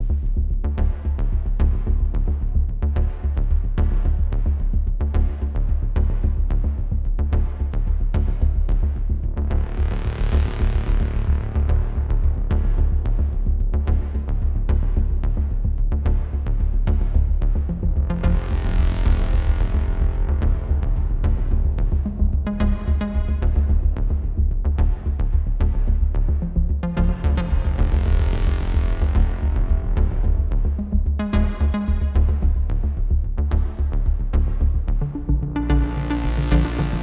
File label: Bass Loop; Moon Patrol